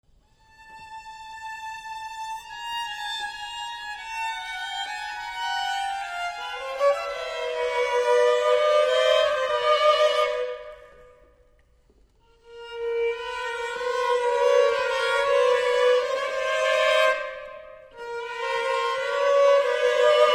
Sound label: String Quartet